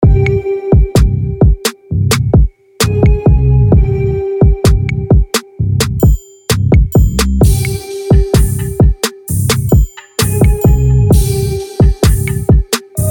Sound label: Swang 130bpm